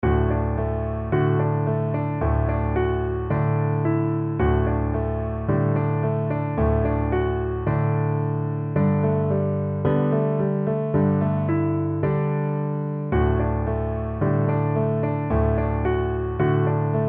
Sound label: piano backing track